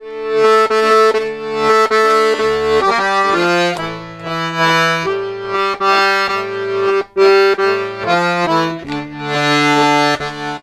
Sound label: 140, Complete Accordions